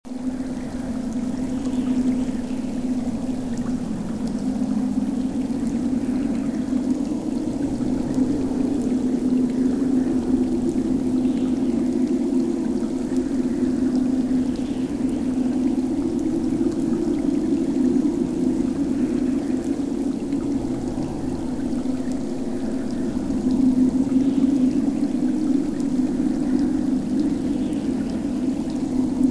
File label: hive amb; co shadow